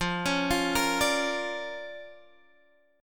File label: D Minor Major 7th Sharp 5th F, guitar